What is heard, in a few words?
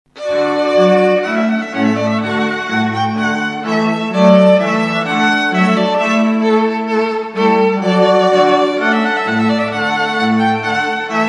Trumpet Voluntary; Clarke